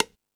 Latin Drums, E-MU Pro-Cussion

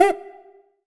R CUICA HI, Drum Mashines; EdgeSounds; Drum Mashines VOL-1; R-100 DRUMS

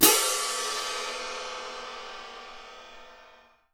CYM P, Percussion; Miroslav Vitous - Percussion & Harp

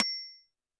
percussion, Roland TD-20

